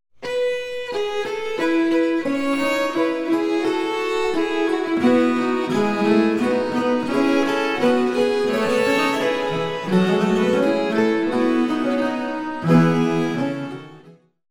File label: cadences